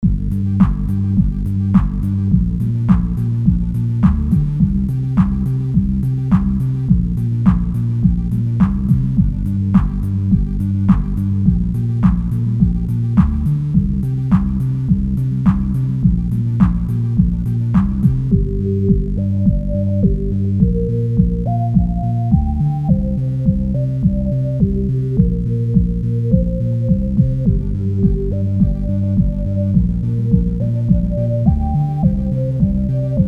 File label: game music